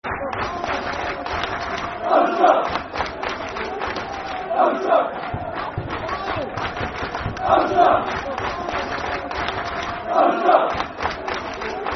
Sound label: (Clap) Football Chant (Soccer Song); Aldershot; Aldershot Town Afc